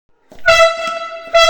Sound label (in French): corne de brume